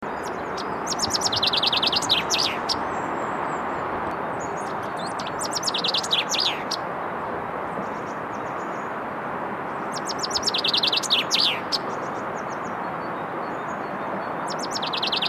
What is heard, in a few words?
fringilla coelebs, vogel; hecke; biologie